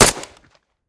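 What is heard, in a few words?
noise of rifle shot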